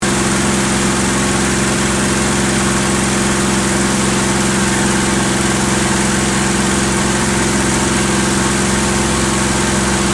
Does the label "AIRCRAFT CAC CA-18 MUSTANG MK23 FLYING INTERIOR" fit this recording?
Yes